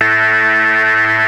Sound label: OSCAR A2, synthesizer; Hollywood Edge - Giorgio Moroder Rare Synthesizer Collection